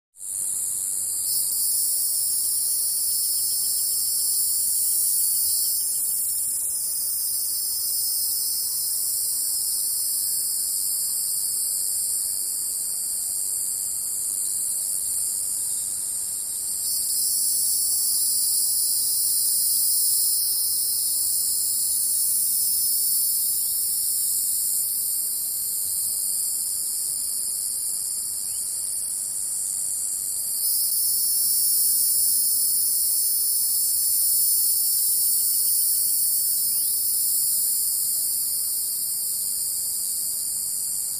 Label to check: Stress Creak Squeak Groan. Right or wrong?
Wrong